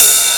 Hats Electro Open

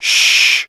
shh; ooh ahh; human

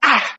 "ah", Voice

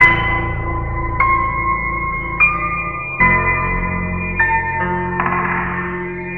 piano, sad loop; loops

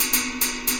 tam tam, drum loops

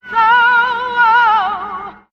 "ho woah" SUNG, voice; ooh ahh; ho hoo woo

